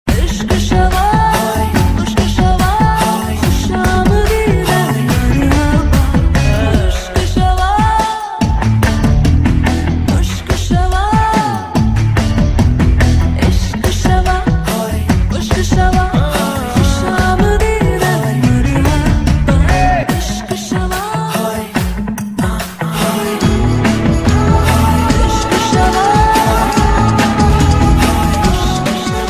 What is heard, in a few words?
Remix